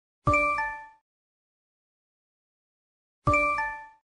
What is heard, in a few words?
Call line